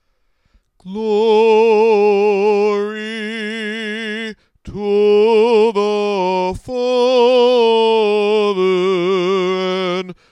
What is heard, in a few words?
Glory Tone 5, Chant